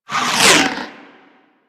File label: Grenade Launch Whoosh; Warframe; Grineer Ghouls; Ghoul Expired; Attacks